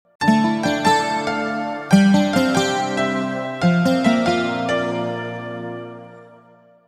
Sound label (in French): mélodie f musique moderne